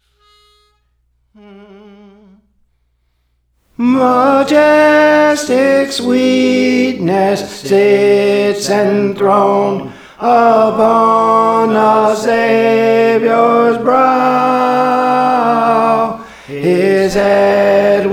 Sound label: Tenor; Praise For The Lord Training; Majestic Sweetness